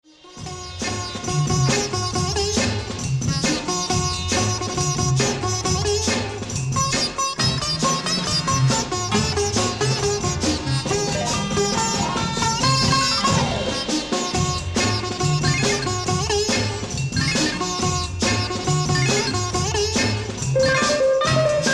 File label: Soul Sitar, insane dancefloor sitar funk from Pakistan